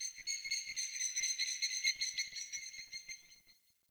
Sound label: Day Bird; Ambience; One Offs; Glades Of Andurin